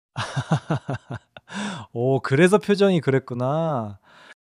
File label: laughter